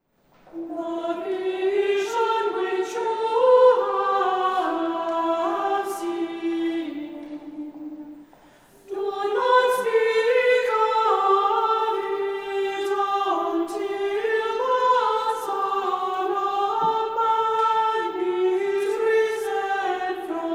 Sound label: Communion Antiphon; Lent II; The vision